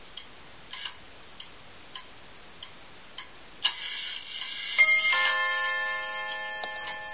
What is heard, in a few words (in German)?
BimBamSchlag 30 Minuten